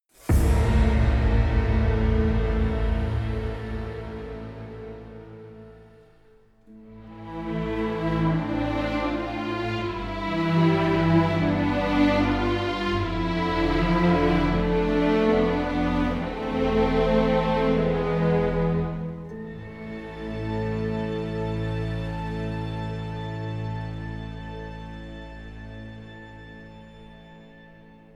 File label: Game Over; Black Mirror; PC Xbox One Linux PS4 MacOS